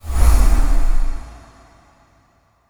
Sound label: teleport; sora